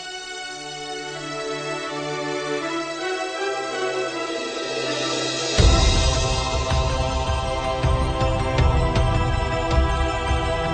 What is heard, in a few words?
crystal strings